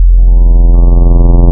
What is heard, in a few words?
GLASS, wavetables Classic Synths